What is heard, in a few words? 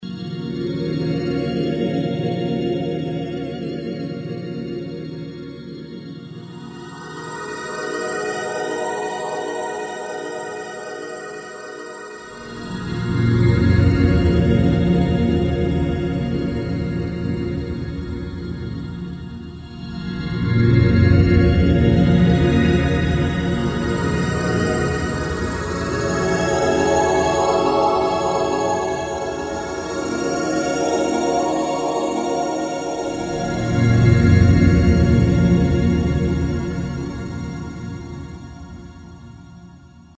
pad; Emu XL1 Xtreme Lead